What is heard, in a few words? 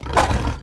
lion hurt, utterance